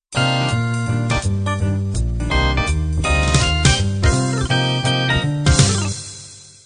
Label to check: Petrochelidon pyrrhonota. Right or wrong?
Wrong